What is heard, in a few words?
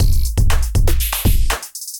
Electrified Kit; Electrified Kits; Ableton; Bomblastic